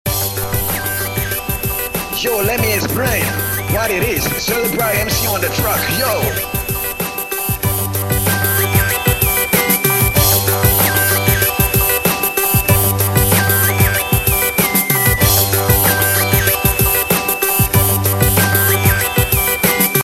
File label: WEST COAST WHISTLE